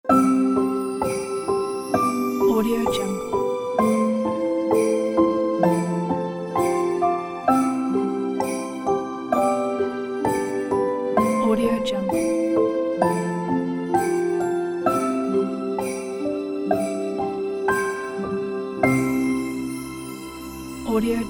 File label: a sweet soft christmas logo